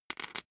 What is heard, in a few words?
poker chip stack small rattle